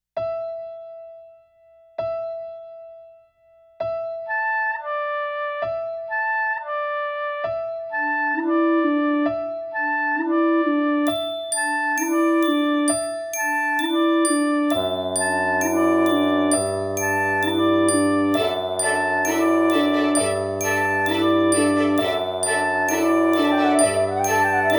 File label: music diary